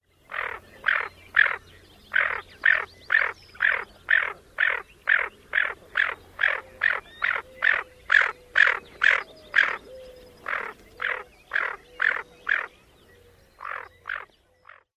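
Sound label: Lesser Scaup, female garbled quacks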